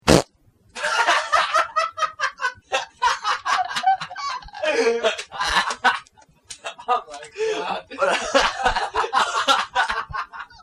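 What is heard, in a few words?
rumble, Farts & Belches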